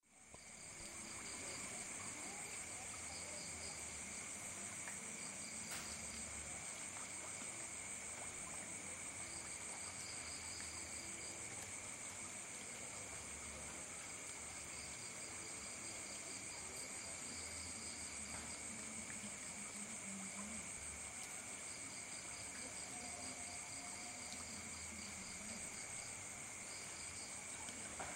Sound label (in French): Bruits d'eau et d'insectes dans la nuit d'Ubud